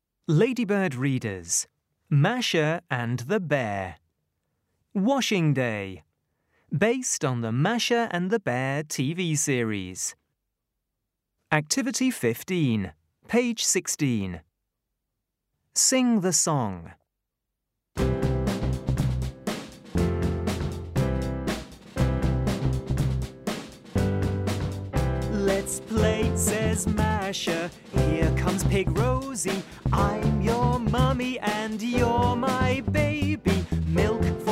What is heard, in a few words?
WASHING SONG; Masha and the Bear Washing Day Activity Book; ladybird books